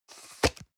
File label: plastic can small lid screw single open